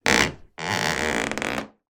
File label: wood creak floorboard; foley; sound effects; sound effects one